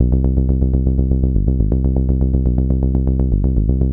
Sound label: 122 BPM Bass Synth C maj A min Tonic C; Pro Bass Synth Studio Kit; MMB